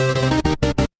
retro game game over

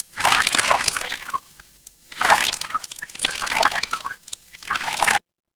water swirl

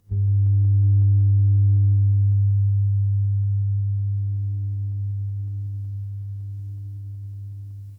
fire electric hum

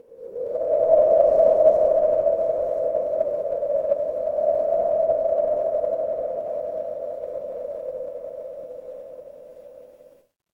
ambience wind

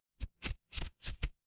paper fabric card shuffle

